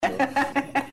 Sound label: laugh